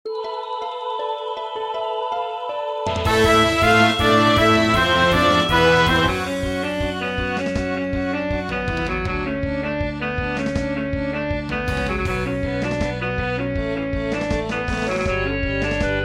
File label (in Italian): tromba percussioni e effetti